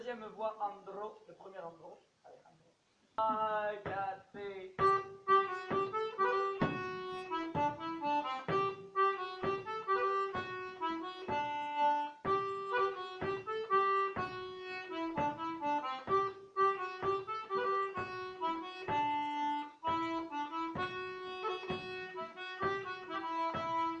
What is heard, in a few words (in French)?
Andro voix 2; Nouvel Andro; Musique d-ensemble